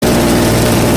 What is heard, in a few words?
minigun